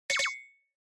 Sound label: Onoma Surprise High